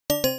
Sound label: User Left Channel; Mumble